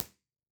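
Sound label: Live Room impulse response; Physics and Electronic Engineering Buildings; B-Format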